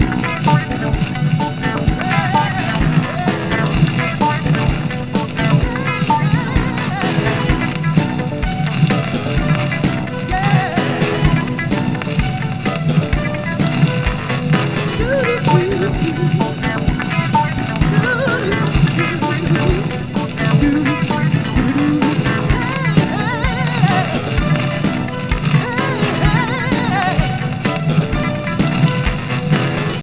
Amb Jukebox

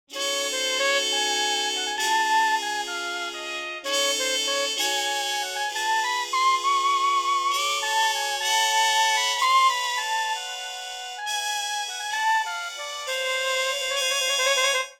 atmo 32beats

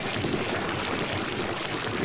Boss Gushing Loop; Hk

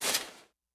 Obstacle Curtain

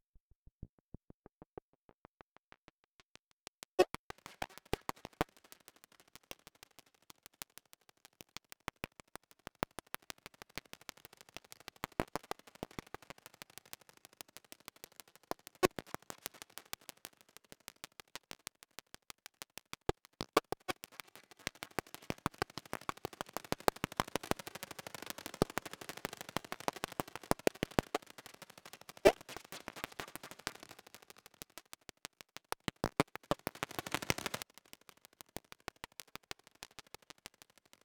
Music NOISE MOVE; CP Music Biome2 NOISE MOVE Phase03

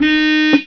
Car - Gator horn

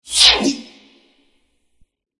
Tennis; Sfx Tennis

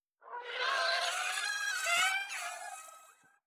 Hydra Vo

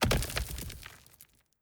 Hydra Stone

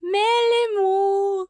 Moth Seer Silhouette Guide

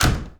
Locked Door

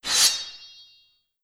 Sword; Legend of Keepers

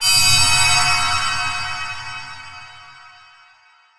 Thunder Spell; Dark Magus Thunder Spell1

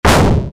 shot fire pop

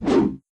Creep Whoosh; dino donkey dash

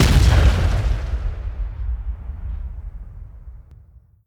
Enemy Army Tank Attack

